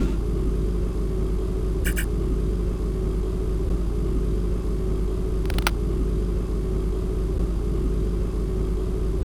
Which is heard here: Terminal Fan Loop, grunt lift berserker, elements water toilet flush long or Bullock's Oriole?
Terminal Fan Loop